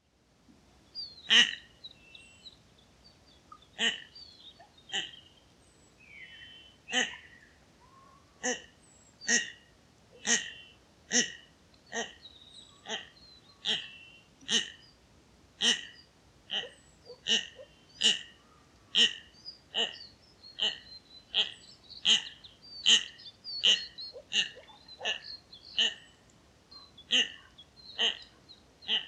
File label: Common Goldeneye - Bucephala clangula; Klykuolė